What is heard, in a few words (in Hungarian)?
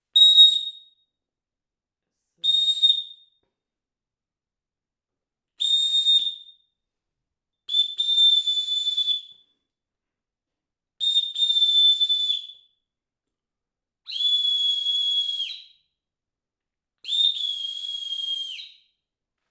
a sip vegen incs meg, sip uttoro; perkupa